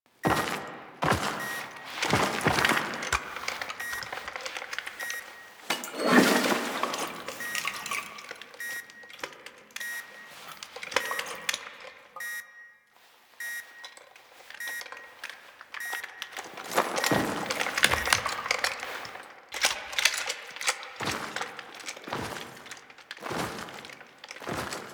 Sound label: Foley Tent; Kahl; Warframe; The New War; Grineer Eidolon Shotgun